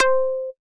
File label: First Card Sound